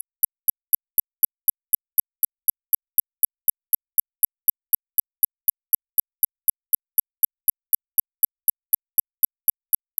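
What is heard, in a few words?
Rolex blend of subtle ticking, metallic chime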